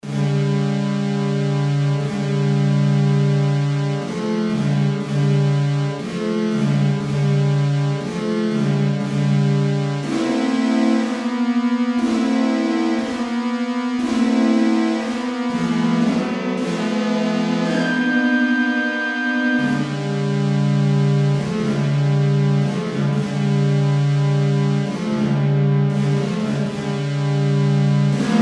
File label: synth brass